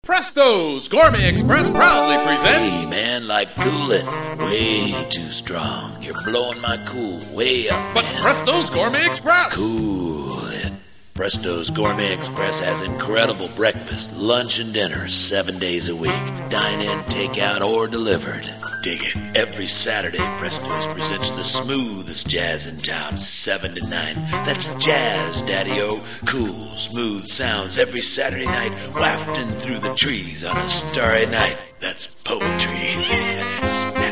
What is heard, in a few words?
JAZZ